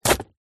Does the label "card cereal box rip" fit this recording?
Yes